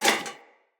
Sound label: Foley Armour Fill D; Enemy; Mockeries